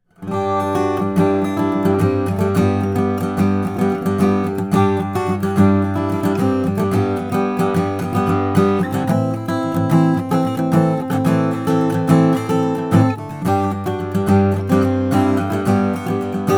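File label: forster session king tenor mahogany, guitars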